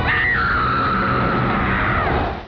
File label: scream; Callisto's scream as Xena is carried off by angels.; fallen angel